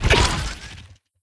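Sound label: equip element; cstrike; valorant; rgx 11z pro vandal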